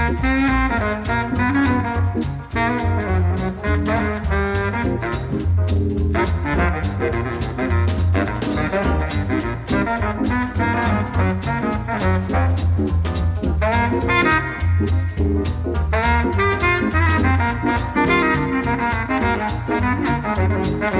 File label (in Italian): swing per sax baritono